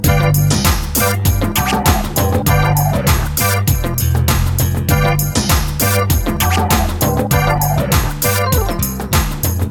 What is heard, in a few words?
Beat